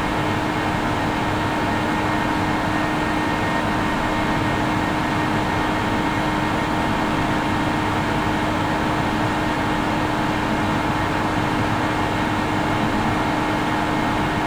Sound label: 3.6-metre-telescope hydraulic room; La Silla ESO; stereo; soundscape